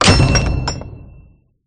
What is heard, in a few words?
harvest gem start; sfgame